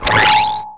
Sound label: sound activate; MOTS